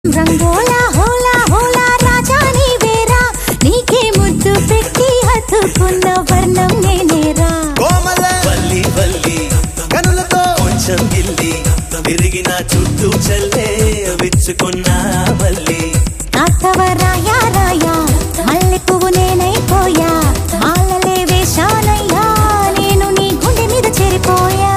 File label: Female Ringtone